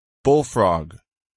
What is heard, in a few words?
bullfrog